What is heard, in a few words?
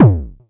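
Club bass drum, Jomox Airbase99, Drum Machines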